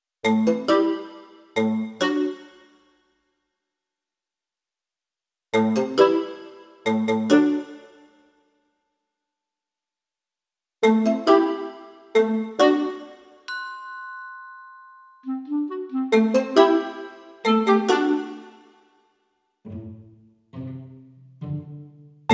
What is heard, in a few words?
No Woodwind Leads No Drum Machine